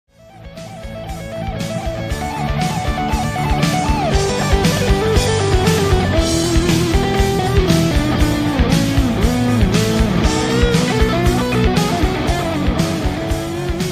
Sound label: No Guitar